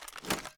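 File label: reload fast extended mag end; gmod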